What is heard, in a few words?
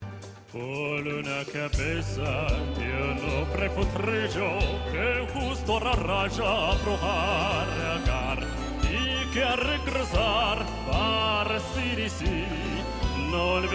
singing